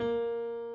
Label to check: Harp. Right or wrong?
Wrong